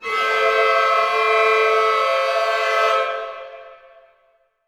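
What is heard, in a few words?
orchestra; Masterbits - Switch FX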